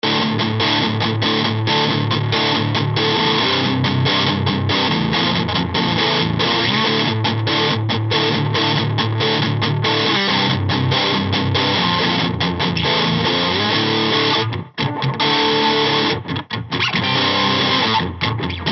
drive unbearbeitet

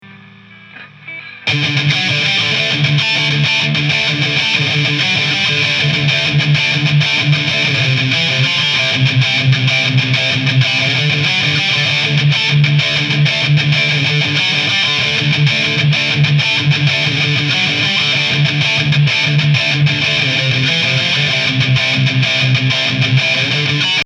Gitarre

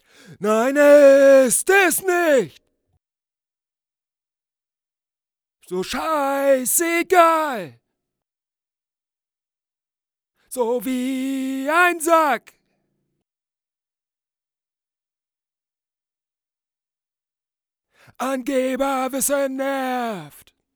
stadion punkrock chor

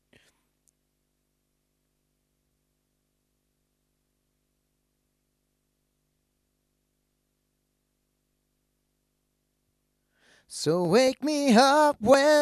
leichtes grundbrummen, great river neve clone; black lion

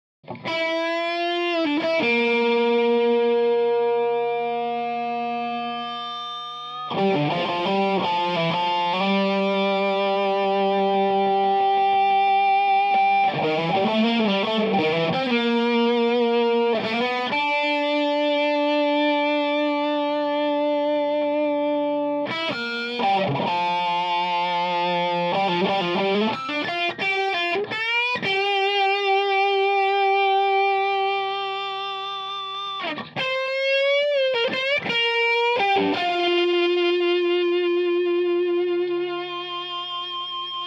crunch u87 raum strat; amp

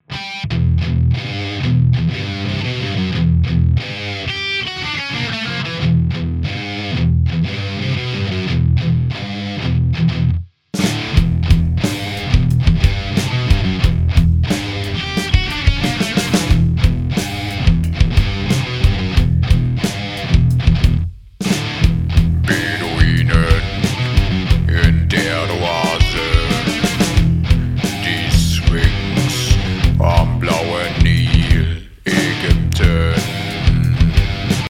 metal, gitarren

